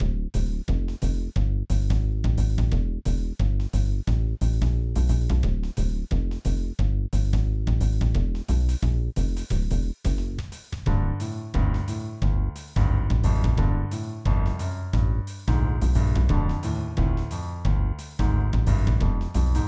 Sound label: song; super snuvi